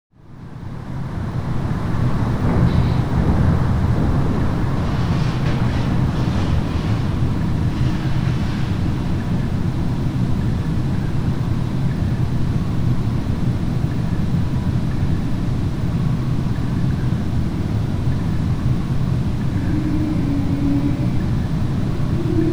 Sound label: Ambient Sound Design